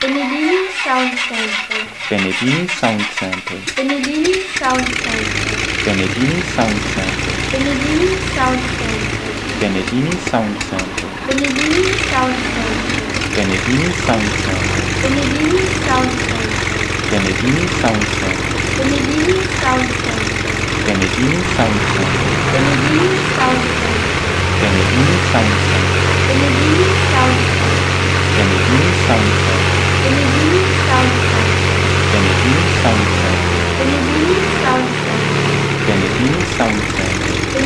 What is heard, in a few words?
Lycoming IO540